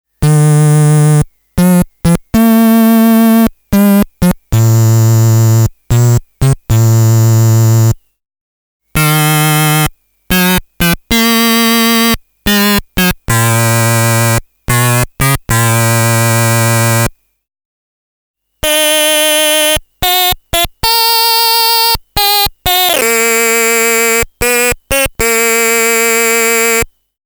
Klangfarben Vibrato

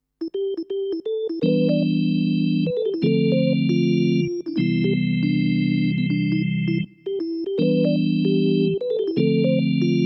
Orgel

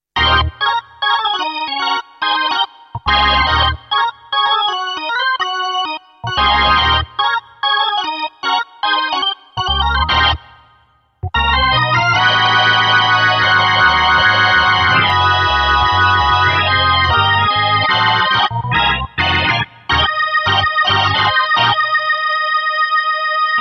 Vox Continental Orgel

